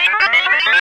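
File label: sphere blips large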